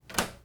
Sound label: Car Door